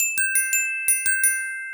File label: glock